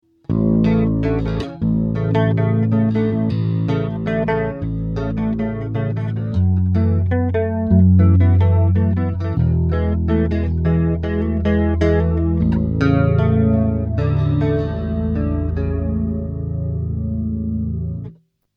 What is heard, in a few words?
Chords; Ampeg Liquifier